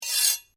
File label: Blade Scrape Fast, Sword; Foley sounds for melee weapons; Swords; Combat